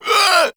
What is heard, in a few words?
Infested Runner Pain; Warframe; Runners